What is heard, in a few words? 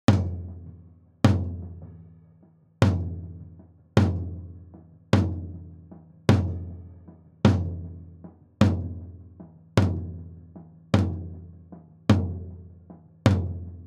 Toms High Debleed Long Tail ON